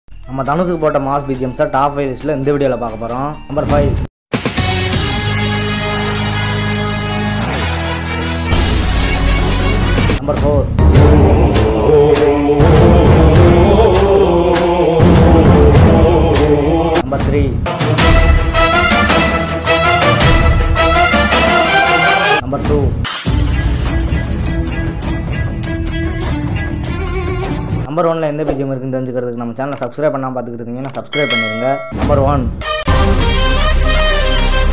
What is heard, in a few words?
mass bgms; Dhanush; Pudhupettai